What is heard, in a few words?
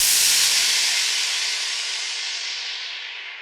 Down Lifter